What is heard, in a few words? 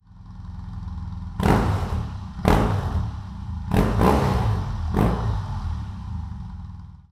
R18 long opened; BMW